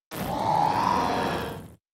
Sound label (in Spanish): Grito de Appletun